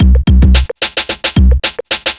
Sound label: block rock kit